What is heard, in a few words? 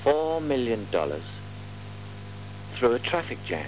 traffic jam